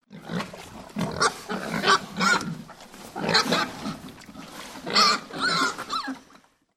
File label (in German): Sattelschweine grunzen beim Fressen